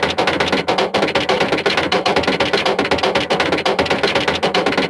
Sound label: engine damaged; World of Tanks Blitz